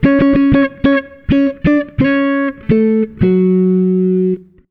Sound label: FK SOLO, Jazzistic; FNK